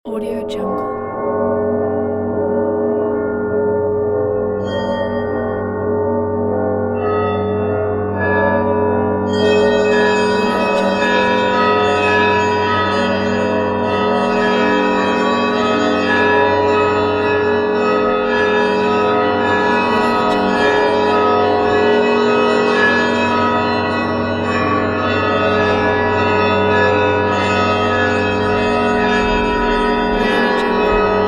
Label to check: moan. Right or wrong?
Wrong